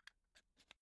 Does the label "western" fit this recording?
No